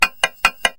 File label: fence build; Farming